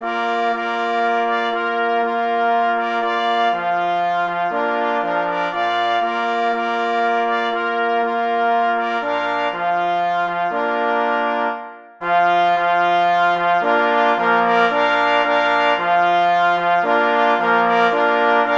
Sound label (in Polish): Fanfara kynologiczna na 2 plesy i 2 parforsy; Erwin Dembiniok (1921-2013) – międzynarodowy sędzia kynologiczny, myśliwy, hodowca fousków